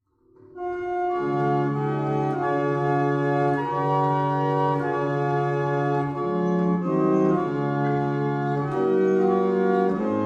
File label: barrel organ; Frome